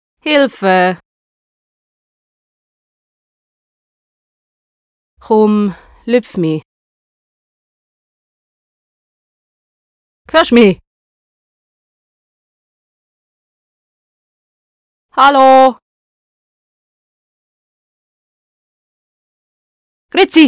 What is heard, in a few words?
Waiting For Game Start